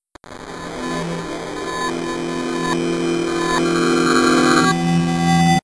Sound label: Shutdown; Windows Never Released Soundpack 1